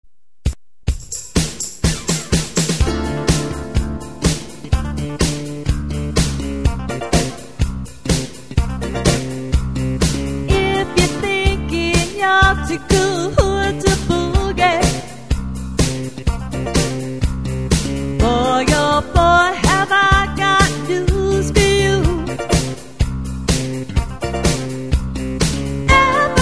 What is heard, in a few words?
disco